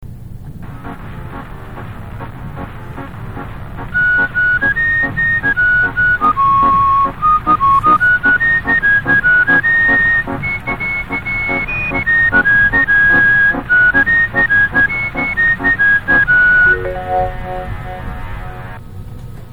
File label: Noto chime